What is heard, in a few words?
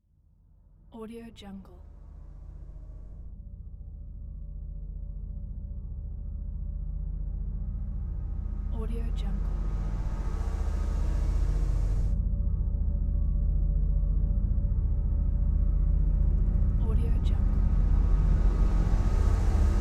drone ambience